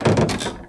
Street Ball Hoop Squeak, Street Basketball; SFX Kit

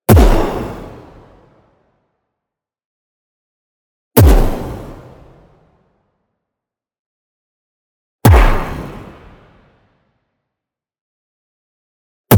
EXPLOSION SHORT Feedback Noise; boom library; Anime Essentials

